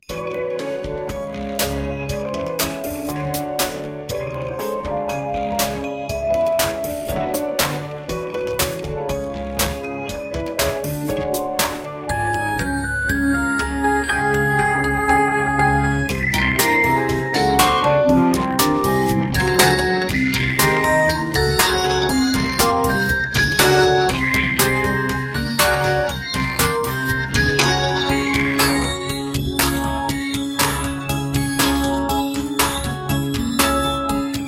an earworm loopable jingle that sounds like an 80s breakfast cereal ad